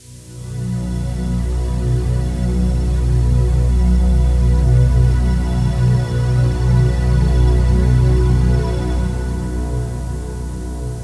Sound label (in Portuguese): ORGAO; INSTRUM